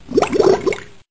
Blowing Bubbles

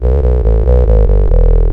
Hot Techie Bass

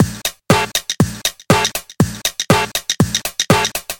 Groovy House Beat

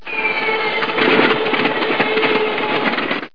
Airplane Breakdown

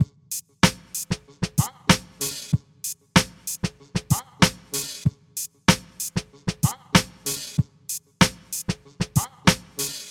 Hip Hop Instrumental

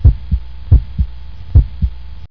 Heart Beating

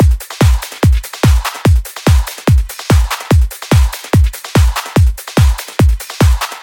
Hardstyle Beat

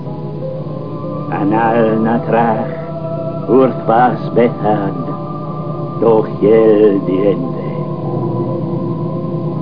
Mystic Incantation

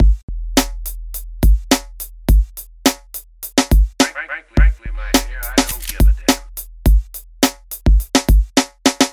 Hip Hop Beat Only

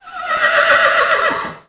Horse Whinny

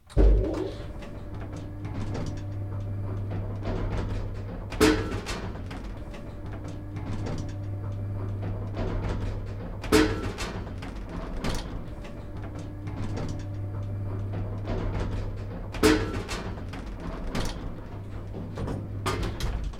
Old Elevator Sound

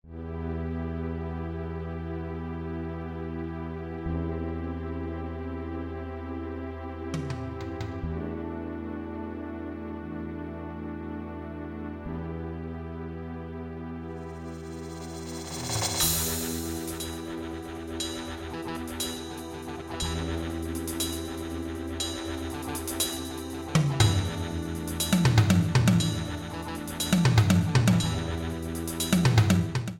Midi; Killer Instinct

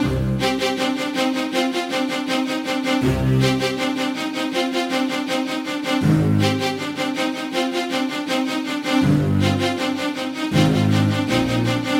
Hip Hop Orchestra

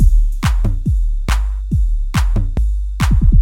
Hard House

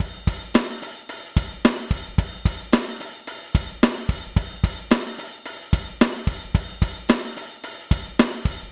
Hard Rock Beat

